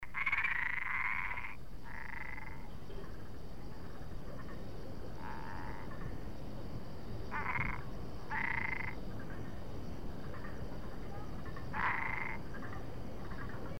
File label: Hypsiboas albopunctatus; Itapeva; MG